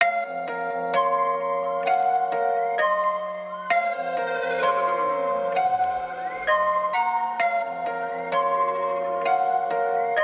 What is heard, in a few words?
130 BPM A# Min, Trap Melodies; Different; Cymatics; Trap Starter Pack